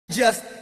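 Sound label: emote metronome intro; actmod; fortnite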